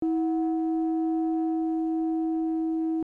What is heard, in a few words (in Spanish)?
Multiarmónicos